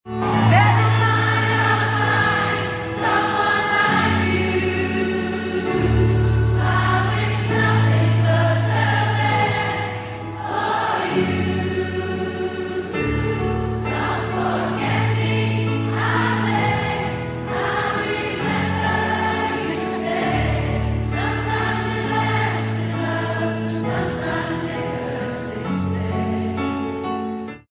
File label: crowd singing